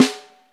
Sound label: snare, Real; Snares